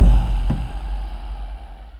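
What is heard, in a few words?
Music Shield; From Dust; ps3; xbox 360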